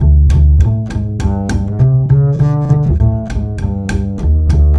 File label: Acoustic Bass